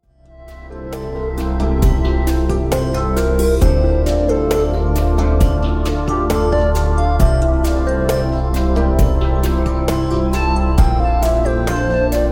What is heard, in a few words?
Relaxing